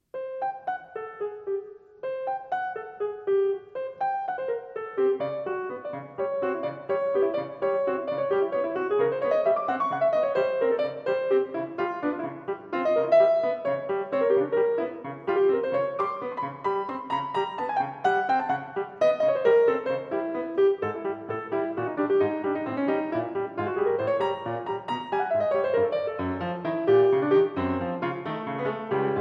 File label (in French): improvisation